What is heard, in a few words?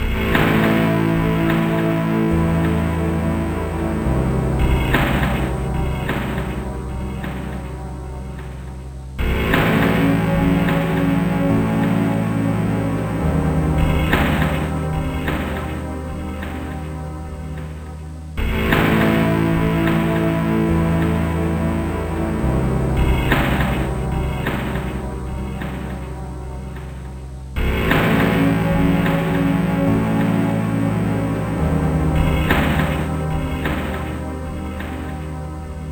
Horror TV